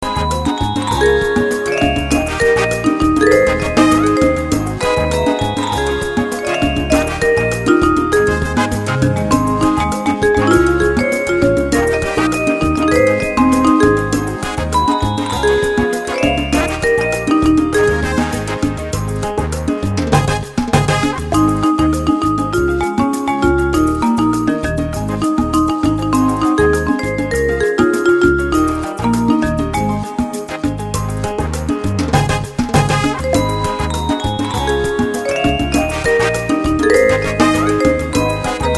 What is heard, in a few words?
Salsa on Everything